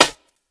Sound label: Snare